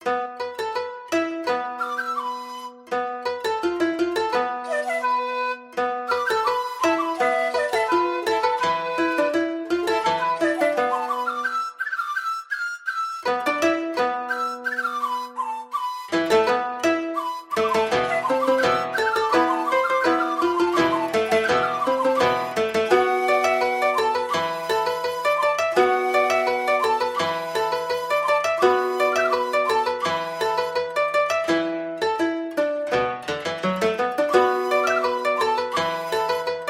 koto 13 stringed

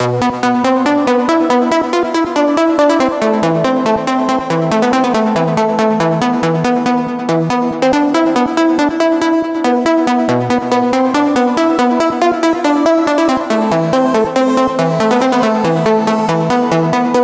melodic enchantment

